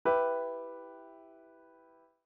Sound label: Minor Chord Progressions Example 4 E MINOR